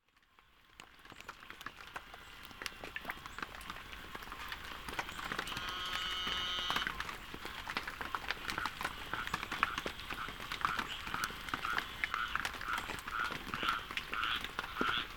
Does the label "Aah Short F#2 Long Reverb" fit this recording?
No